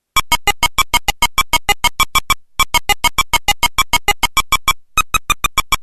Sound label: PC-8801